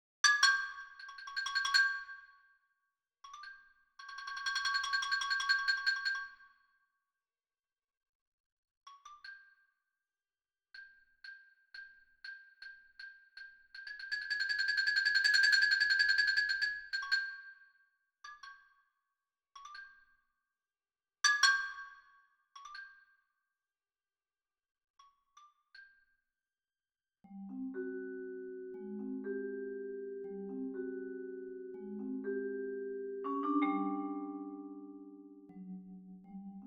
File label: Mallet Percussion